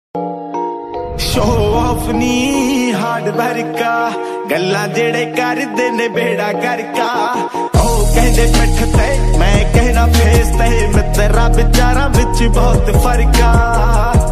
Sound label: DJ Punjabi Ringtone